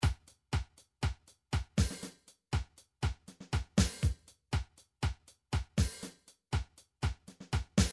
midi drums tango

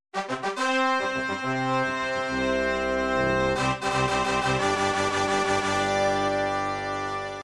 horn; video games; princemaker2

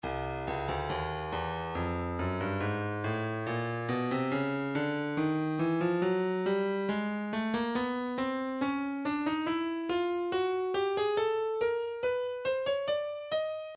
breakbeat 070 bpm; intimate moment d; Stylus Breakbeat Midi Files

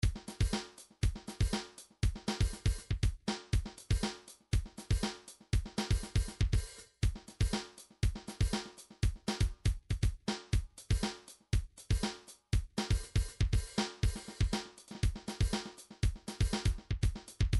midi drums percussion; Midi Vol 4

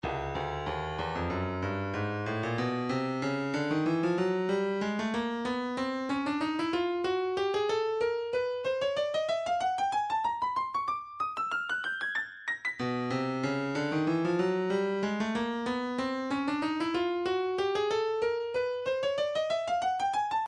breakbeat 094 bpm; bang up a; Stylus Breakbeat Midi Files

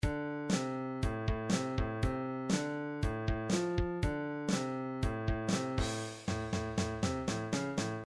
drums break, midi

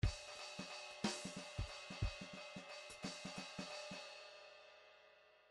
grooves 3 4

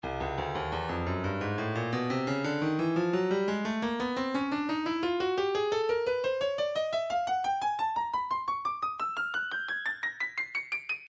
breakbeat midi files 085 089 bpm; frenutic b; Stylus Breakbeat Midi Files

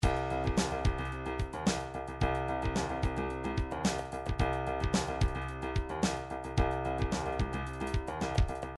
triphop; Newtronic Triphop Construction Kit

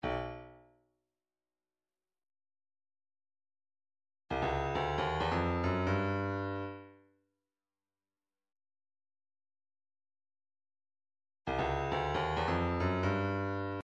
cowbell, midi

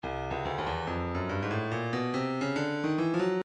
breakbeat midi files 070 074 bpm; street rage; Stylus Breakbeat Midi Files

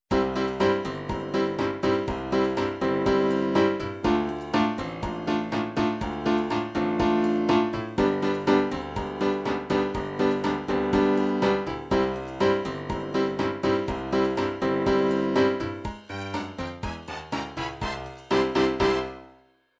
chachacha

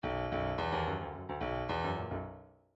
bdf midi file grooves funk r funk r

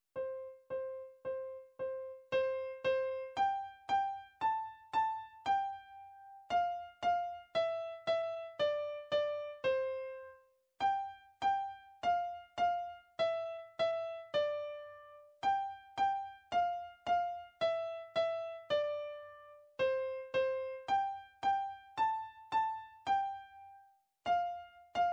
classical twinkle

